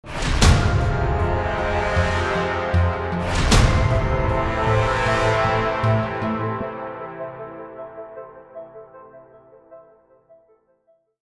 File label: lose